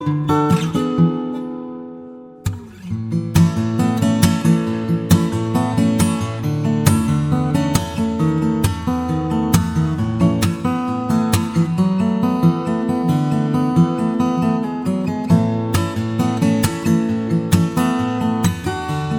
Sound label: new soft guitar ringtone